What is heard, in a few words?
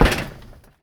metal grate, player footsteps